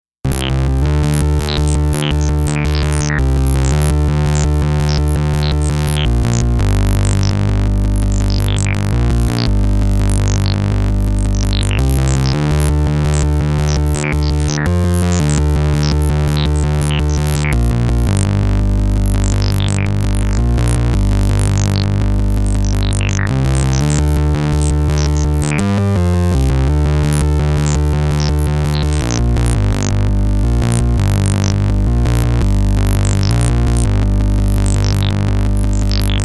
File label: MOOG MAVIS Dry Mix